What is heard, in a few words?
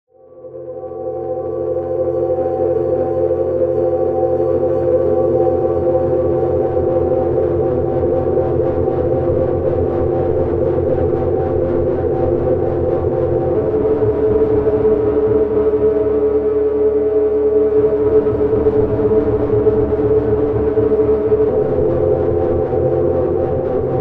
Drone